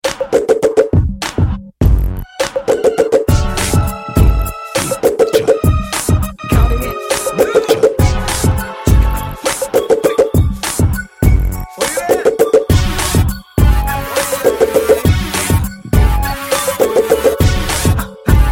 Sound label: Beat